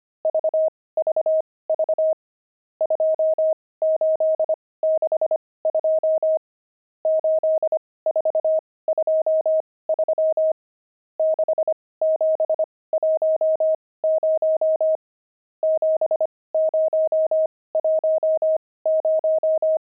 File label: pangram, intermediate pangrams files